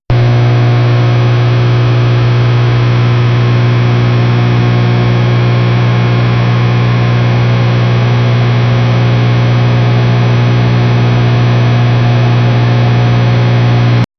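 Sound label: retro the sounds of switches, relay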